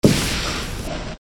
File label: pneumatic blast